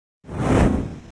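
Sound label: Succubus Walk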